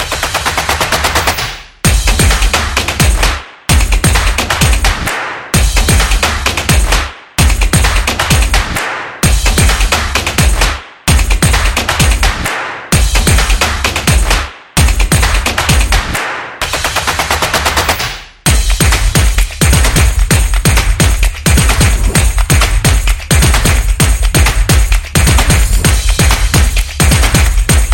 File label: Typo Intro